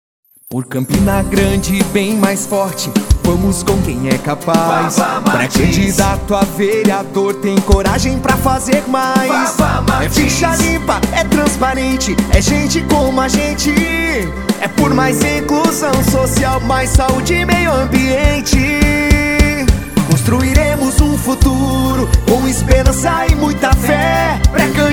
Jingle VEREADOR PRE CANDIDATO; Vava Martins; RENOVACAO

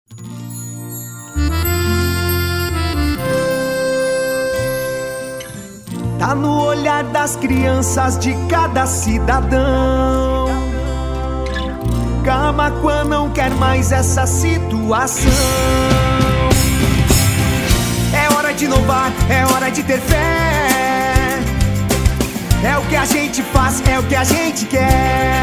Jingle Inedito VEREADOR; Maranata maqua

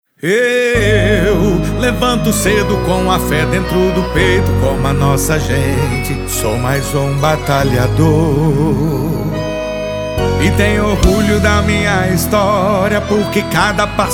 Jingle DEP ESTADUAL

